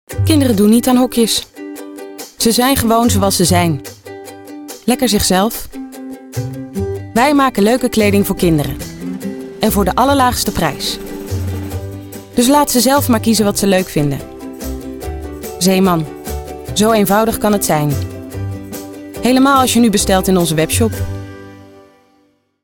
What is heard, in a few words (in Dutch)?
muziek; Zeeman